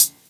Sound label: HH, Dance Mega Drums; Best Service; HH-HIP